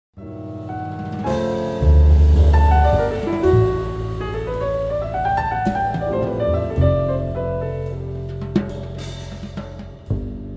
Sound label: Free Folk Jazz